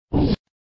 UI INFO; Golden Sevens DX

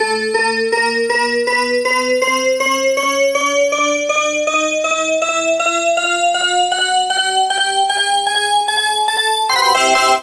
freespin add; Grand Casanova

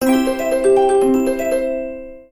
collect freeround; Book Of Lords

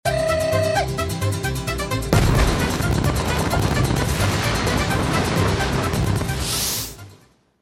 bigwin big; Fruit Fiesta; Wazdan

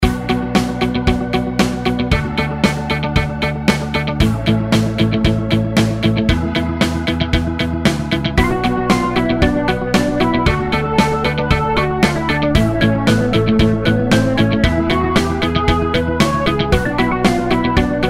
muscle cars reelspin bg

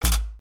stop; Beauty Fairy

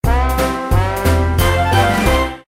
WIN SMALL; Roaring Forties

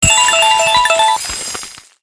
freeze; games; Diamond Cats

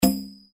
reelspin click; Yu Gong

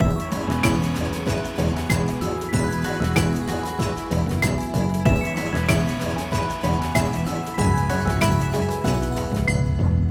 intro loading ambient; ambients; Xing Yun Jin Chan

